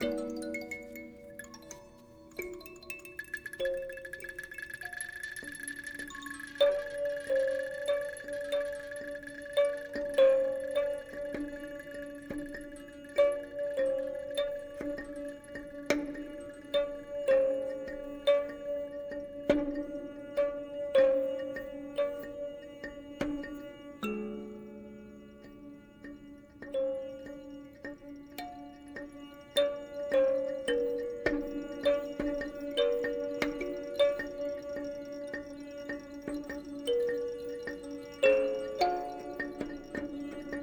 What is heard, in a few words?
computer club music; first ever meeting; business card